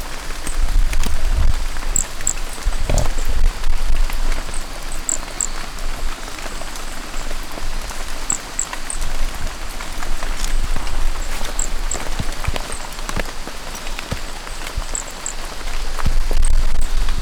Brachycephalus sp; Trilha do Corisco

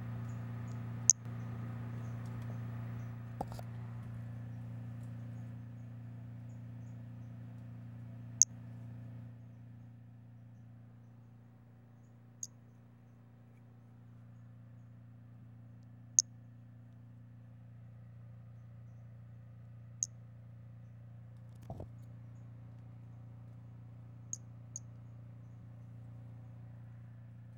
Brachycephalus albolineatus individual

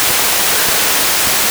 pond

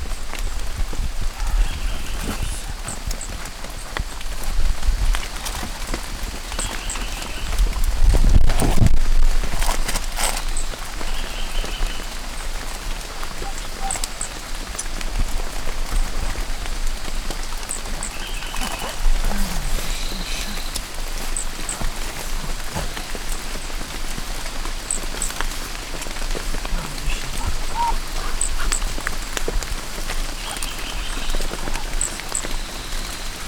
Brachycephalus sp; Trilha do Corisco

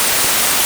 pond

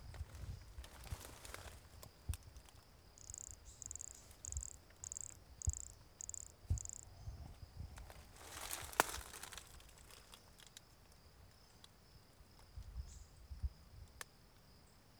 Brachycephalus sulfuratus near Jurupara dam; MRBornschein

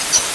pond

